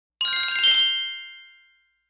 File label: Twinkle; gekiban